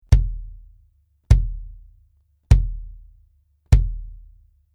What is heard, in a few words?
BASS Onyx Reso Bury Beater; evans; naciagi do centrali rezonujacy